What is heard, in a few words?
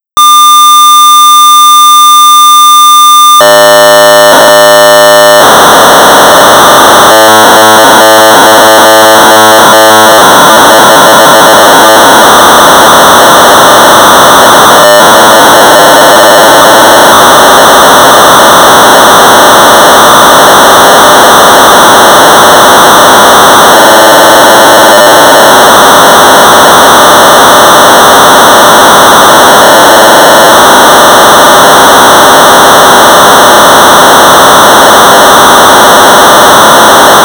Sorcerer 1200 baud; ZAP80-Machine code disassembler for Sorcerer; Ian Robinson; Synapse software